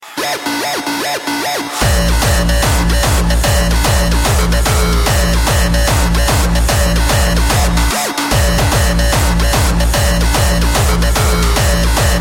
Hardstyle

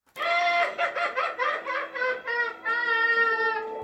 laugh; joker

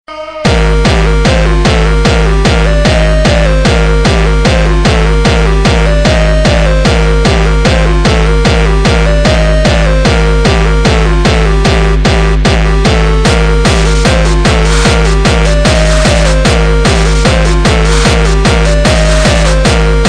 Jumpstyle

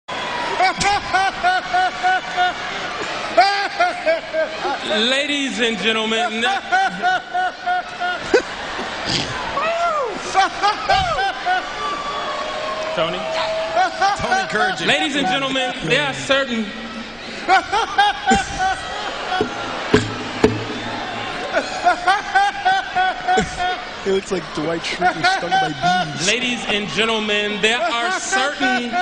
Laughing; Tony Atlas

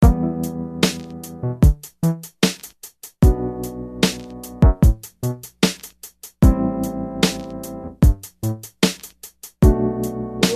Chill Tone

